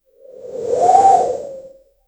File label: multiple wind woosh sound